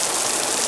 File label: Rain, mt-32